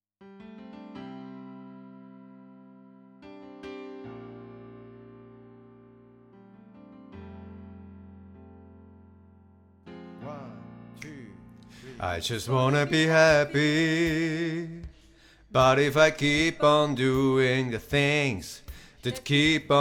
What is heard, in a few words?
Bass